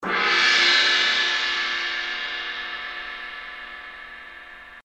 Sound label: CYM